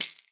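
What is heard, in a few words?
maracas; Xsample VOL-9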